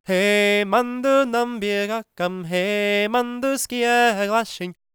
GAELIC, World Voices; ILIO; Vocal Planet

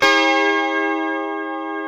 D 4 C funk chord, guitars; Spectrasonics; Hans Zimmer Guitars VOL-1

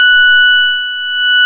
PULSEMOD, ARP Synths

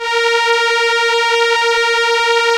STR ELKA, Elka Strings, Elka Violins

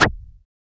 FX Analog PER; Sound & Vision - Gigapack II

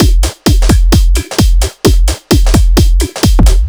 No Clap, Drum Loops 130bpm; Zero-G; Soundclash Nu Dance Electro; Acidized Wavs

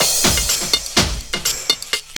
TRIP 90, trip loop

